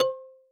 marimba kalimba; ゆめ2っき

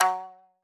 marimba shamisen

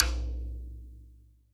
marimba drum; ゆめ2っき

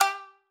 marimba shamisen; ゆめ2っき

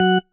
marimba tissue; ゆめ2っき

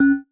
marimba glasses; ゆめ2っき

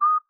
marimba; ゆめ2っき

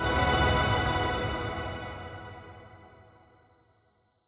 chime

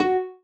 marimba rainbow

